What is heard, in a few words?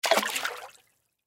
Splash Footstep, Water Splash Footstep Into Bathtub; Splash Sound Effects; Splashes; Blastwave